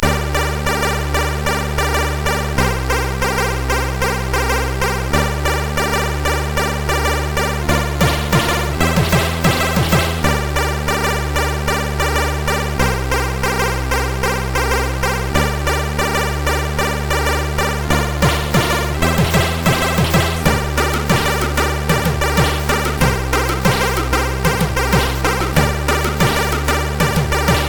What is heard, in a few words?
Short n Sad, CSG8580R5; hvsc